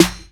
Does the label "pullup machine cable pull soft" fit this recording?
No